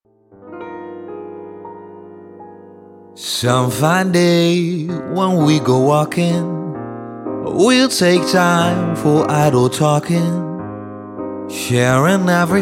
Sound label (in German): Jazz Gesang